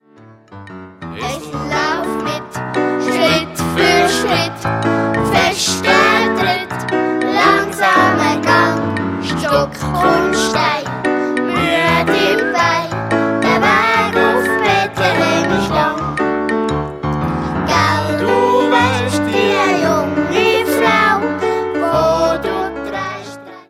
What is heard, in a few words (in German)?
Mitsing Wienacht Esel lauf mit